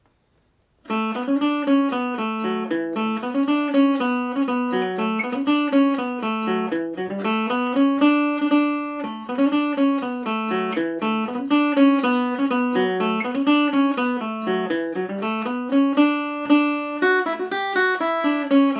nemesis dmaj, mandolin; The Nemesis

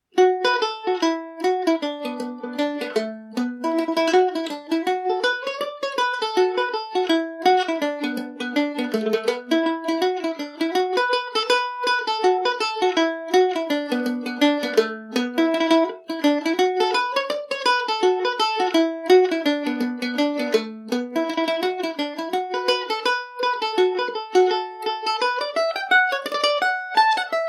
Irish mandolin; martin wynnes revisited

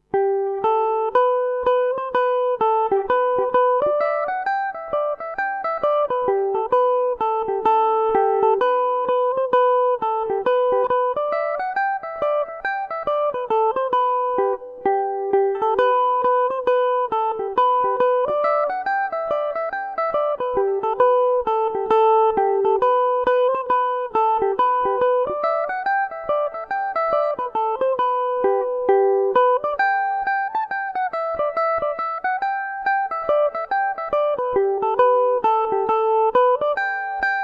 green cockade gmaj, mandolin; The Green Cockade; Revelation Jaguar electric mandolin

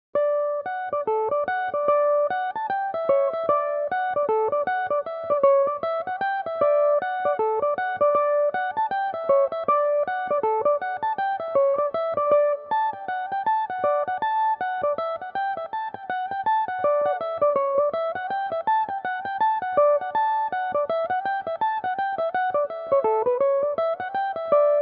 reel d major, mandolin; The Green Garters; Belmuse EM-190 electric mandolin

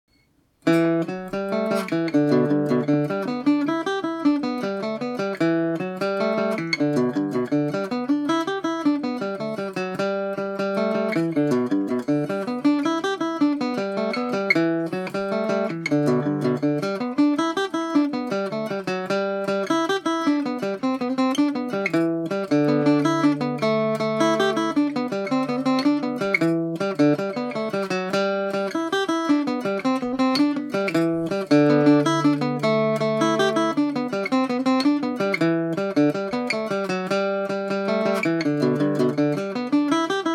catrionas jig gmaj, mandolin

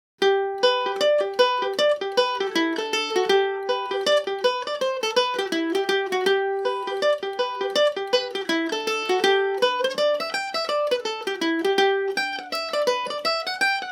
drummond lasses gmaj, mandolin

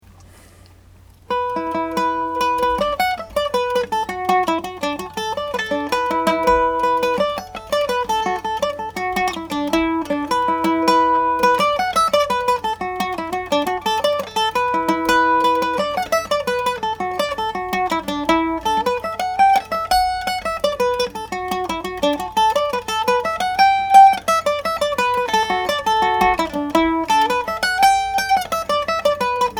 jig, mandolin; killavil jig

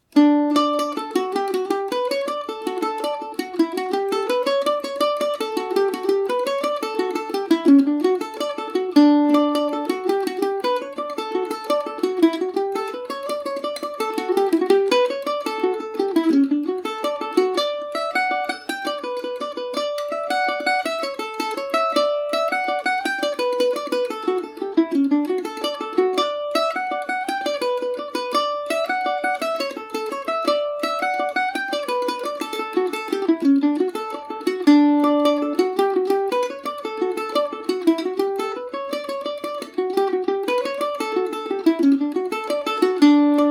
benedicts rambles dmaj, mandolin; Paris Swing “Macaferri” mandolin

